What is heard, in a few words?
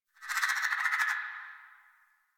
tree critters angry; Ambience; Props